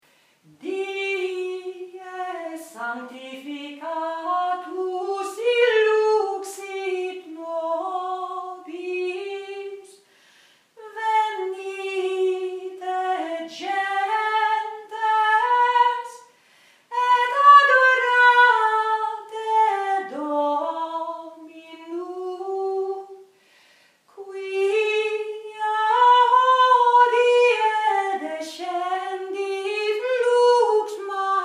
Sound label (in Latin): Dies Sanctificatus bas